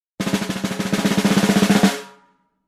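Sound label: Drums Snare Med Crescendo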